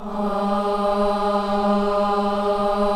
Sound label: KIDS CHOIR, Gospel; Spectrasonics; Vocal Planet